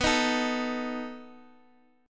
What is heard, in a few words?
B 7th B down Guitar DADGAD